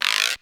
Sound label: Guiro Long; Ensoniq ASR-X Pro